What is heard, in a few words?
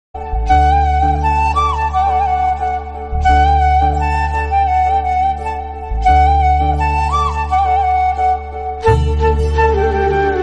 song music bgm love